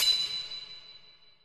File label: shock; animal